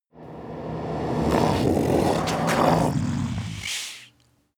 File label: demon dark voice reverse horde comes